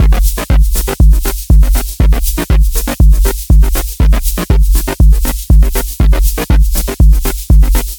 Drumbeats Melodic 120 Bpm Deep Compressed House Beat With Ravish Synth